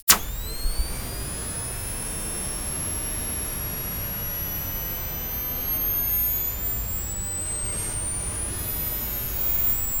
lazer being shot but simp; laser

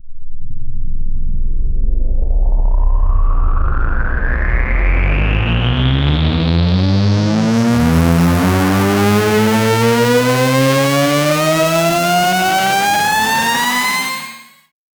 epic riser bass, build up

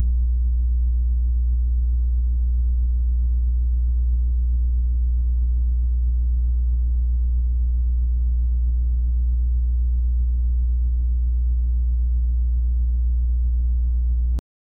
warm ambient organic hum

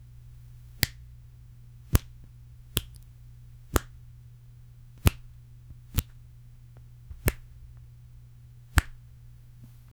quickly squeezing a fabri; crunch

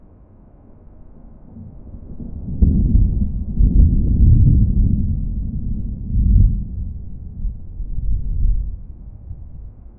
thunder rumbling in the, dinosaurs

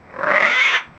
anthropomorphic red eared

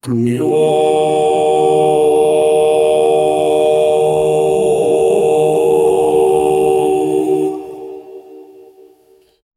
gregorian chant

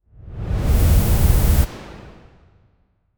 climatic whooshes; atmospheric

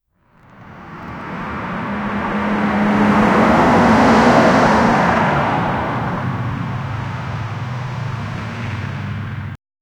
car driving sudden tire; accidents

